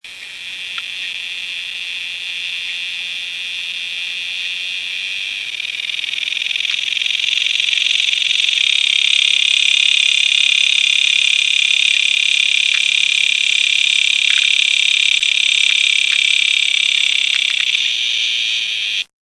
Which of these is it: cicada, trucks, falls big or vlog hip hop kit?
cicada